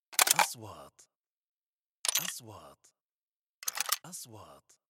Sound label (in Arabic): نغمة كليك; transitions